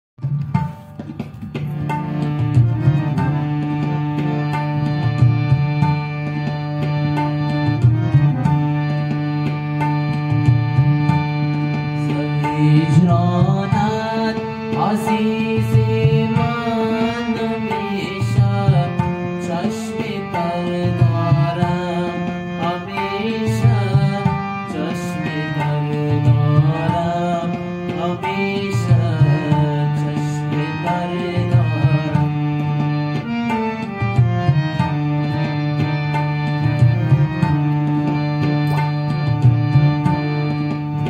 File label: Harmonium